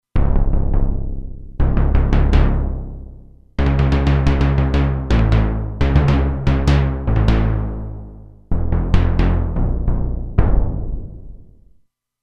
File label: Timps, Roland Alpha Juno-2; Original Sound Chart patches